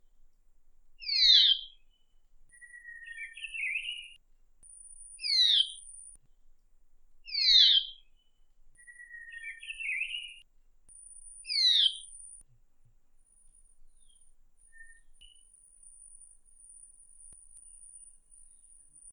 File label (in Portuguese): Canto do Uirapuru Vermelho